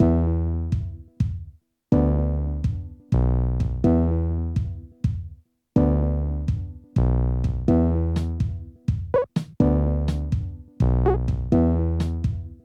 menu; baba is you

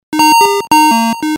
spawn; snail against squirrel

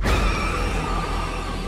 black screech; gris

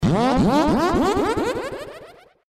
ultra spicy spray; pikmin 3

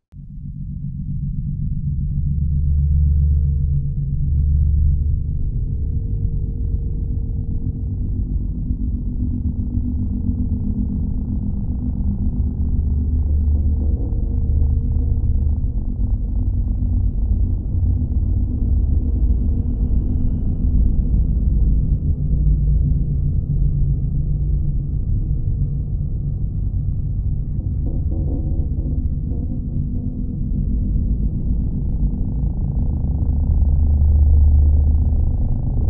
ambiance; five nights at freddy s

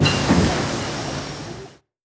research complete

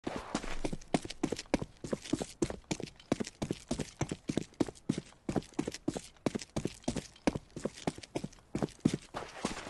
footsteps; counter strike 2

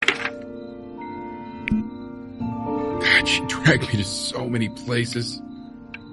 jingle; before your eyes

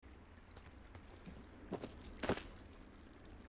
player climbing down obstacle; firewatch

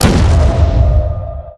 energy sing explosion; portal

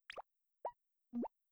buttons; battleblock theater

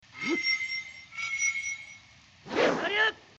weapon charged; Zelda - Breath of the Wild

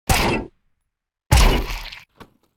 headshots; counter strike 2

